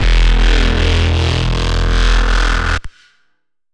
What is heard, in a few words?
Reese one shot E 175